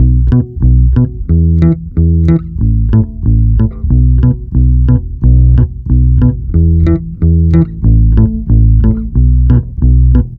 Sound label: BASS, Roots Funk; USB Soundscan vol.41; AKAI